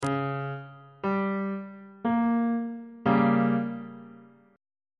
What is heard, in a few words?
g diminished 2nd inversion on bass clef